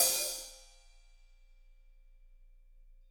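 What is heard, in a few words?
hi-hat, Drums; Bob Clearmountain Drums I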